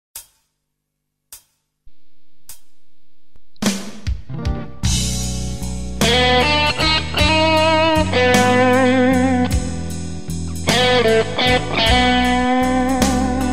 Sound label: blues